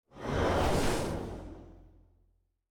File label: gate main